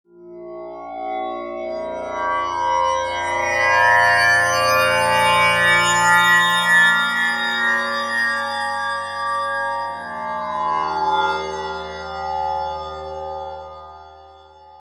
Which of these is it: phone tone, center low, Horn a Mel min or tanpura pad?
tanpura pad